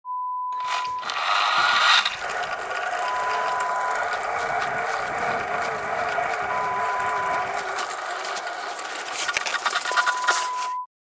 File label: Zip Line sound